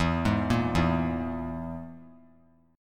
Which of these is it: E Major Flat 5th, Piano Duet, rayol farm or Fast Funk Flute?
E Major Flat 5th